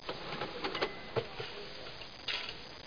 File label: cash rg; Image Bonanza; Expert Software; ondajob